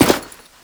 Step Default

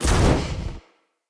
Casting Device Cast Right; Weapons; Player; Fenn; Casting Devices

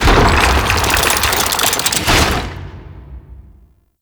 Ship Void Portal Hand Close A; Gameplay; Ambience; Warframe; Corpus Ship Remaster

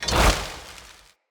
Casting Device Cast Dual

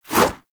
Melee Whoosh; Warframe; Sentients; Brachiolyst

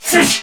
Pain; Warframe; Corpus Crewmen; Crewman C; Dialog Barks Battle

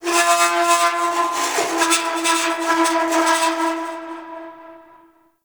Metal Groans And Scrapes; Ambience; Warframe; Grineer; Asteroid

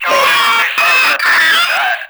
Proto Infested Runner Vocal Attack; Warframe

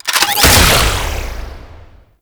Trapper Drone Fire Start; Warframe; Tau; Prime Grineer

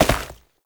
Step Ice; Warframe; Oraxia; Foley

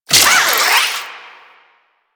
Repair Drone Claw Spin; Necramech Repair Drone

